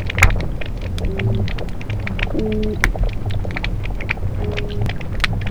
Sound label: oyster toadfish, underwater